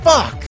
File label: rage; w00tguy; arcade